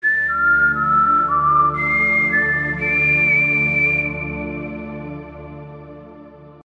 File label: NEWS RINGTONE